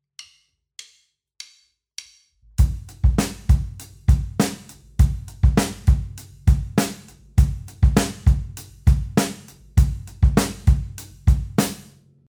Clave Rythmus; Schlagzeug

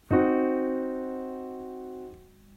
Vermindert, akkorde dreiklaenge

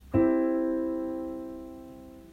Dur, Akkorde Dreiklänge

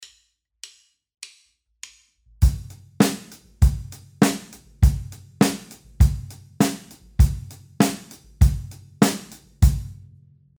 4er Rythmus; Schlagzeug

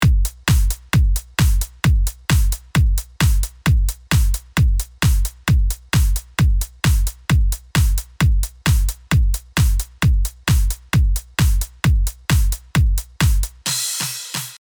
Drums ohne Hall

